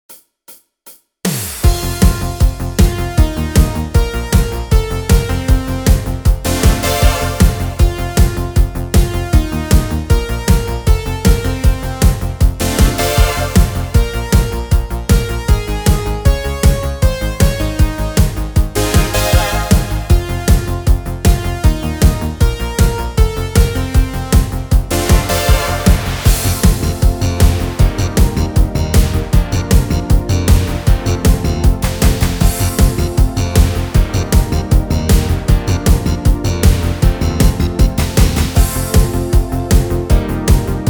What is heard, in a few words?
Rock